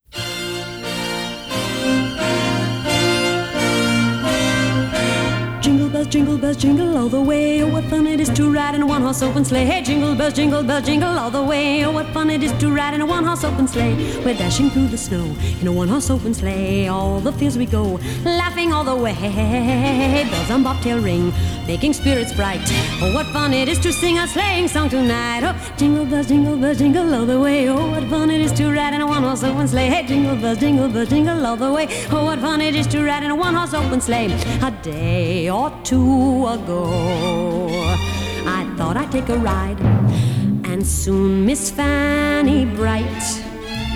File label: Jingle Bells